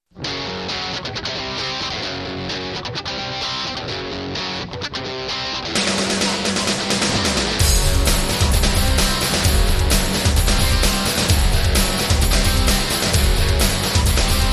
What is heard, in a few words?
No Guitar Melody